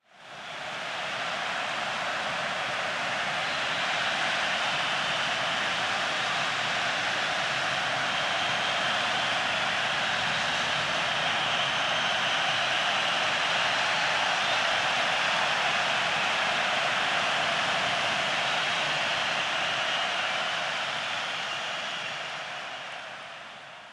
Crowd Audience's Tension - Large Crowd - Very Large Sports Stadium Crowd; Hollywood Edge; sound designer's toolkit v2